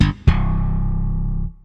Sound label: WRONG ANSWER; Rosegarden